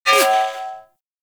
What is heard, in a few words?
Bullet Impact Debris Pipe; Warframe